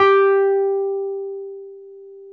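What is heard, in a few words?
Wurlitzer electric piano; Sonic Reality; Vintage Timetraveler; WURLITZ EP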